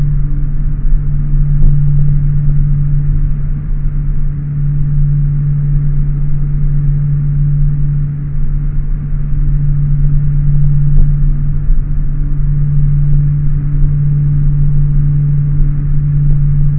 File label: cfm hum, CFM56 wing aft; A32X